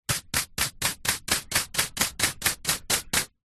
brush small hand held brush concrete several fast strokes; household; zapsplat